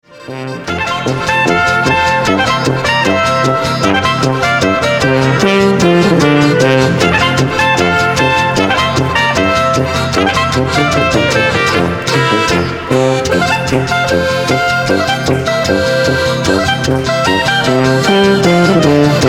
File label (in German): Polka